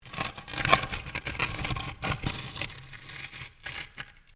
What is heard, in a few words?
Stone On Stone Large Scrape